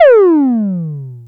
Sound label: Zap; Ableton Live 12 Lite; Core Library